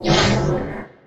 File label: Pickup Magic; Pickups